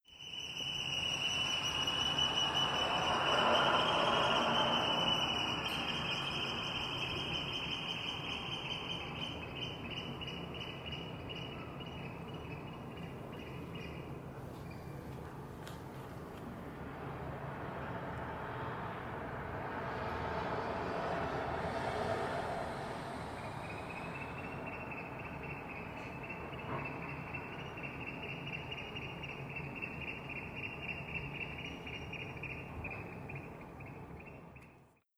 meriharakat; linnut

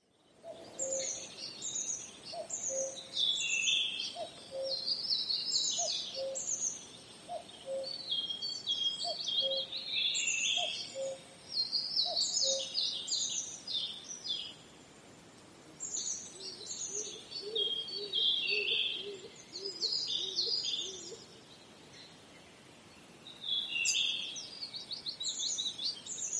cucu ja kyyhky; linnut

